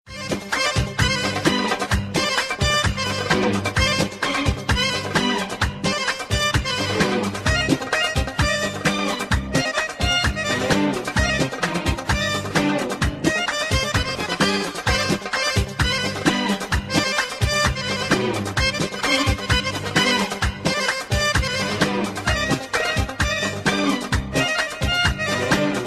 Middle Eastern Dance

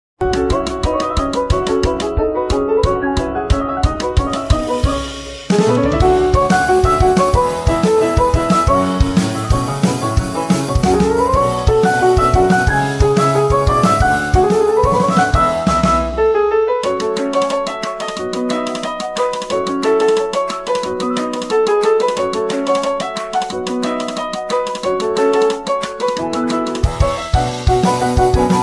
hot cross buns, ringtones children

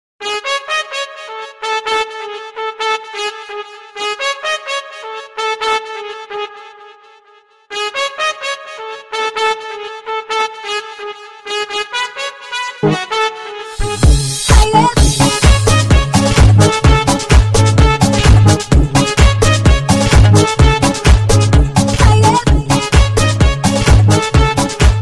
army trompet; ringtones

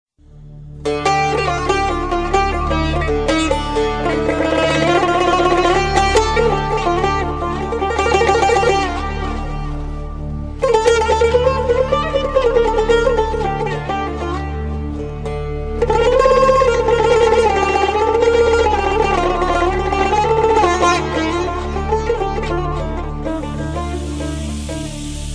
bosnian folk

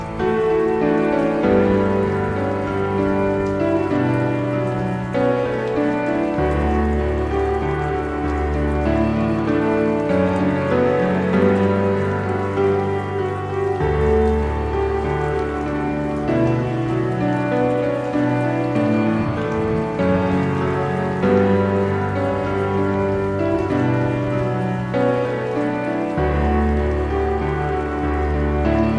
piano sonata; classical

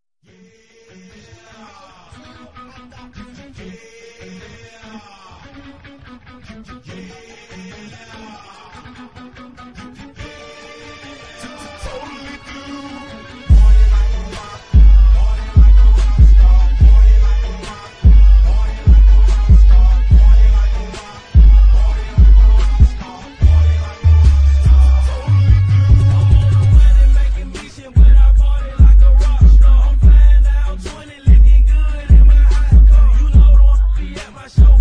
clean bass boost

